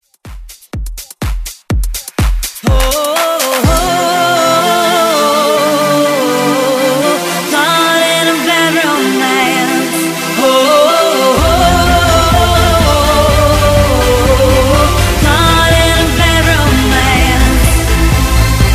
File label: Dance Redrum